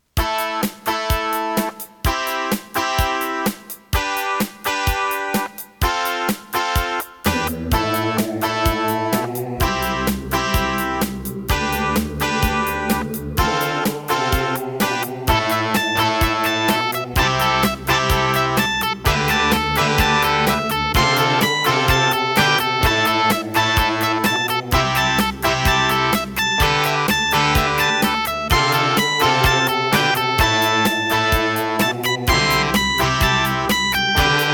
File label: Roland CM-500; raptor call of the shadows